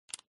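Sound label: checker side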